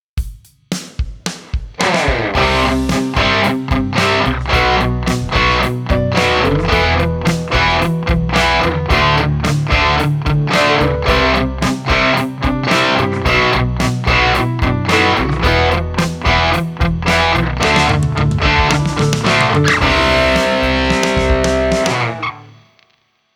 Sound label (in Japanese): ダブリング; boss gx1